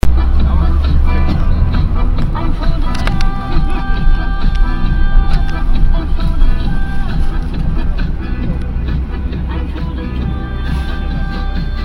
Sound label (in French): Arrivee bus frontiere; mongolie; Oulan-Bator